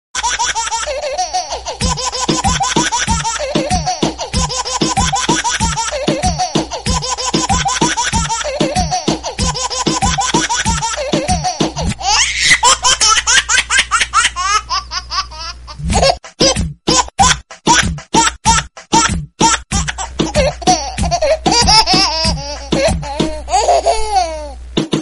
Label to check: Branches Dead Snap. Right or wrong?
Wrong